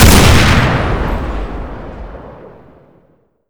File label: 50cal; garrysmod